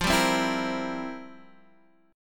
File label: F Major 7th Suspended 2nd Suspended 4th, Guitar, Drop D; down; chord shape